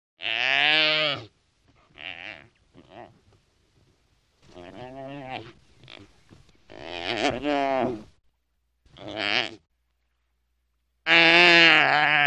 blejanie antilopy

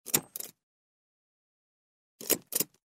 prostavlenie pechati

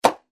lopnuli paket

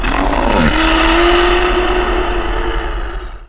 krik zombi

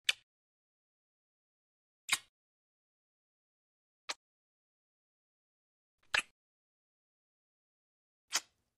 poceluja

